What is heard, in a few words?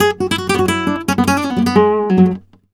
gta a, Flamenco, Guitar, Picado